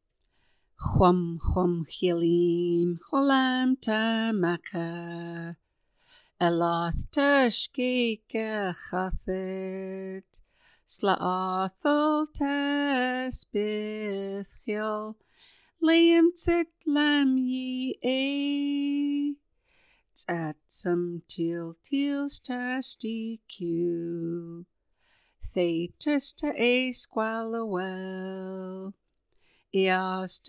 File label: Jingle Bells